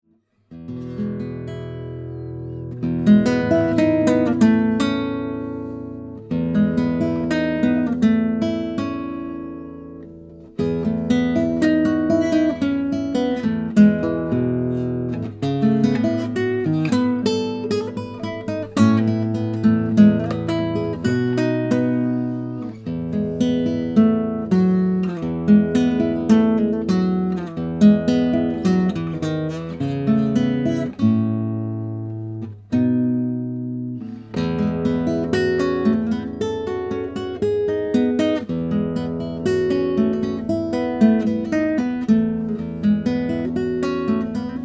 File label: Nylon guitar; Oktava ML219X; Oktava Xaudia MK219 X cardioid condenser